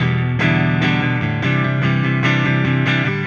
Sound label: Silos Clean Strum Guitar, Indie Rock